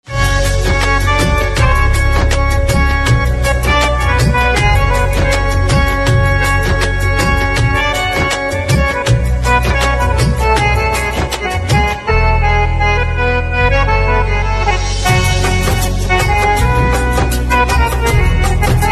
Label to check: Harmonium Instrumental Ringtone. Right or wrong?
Right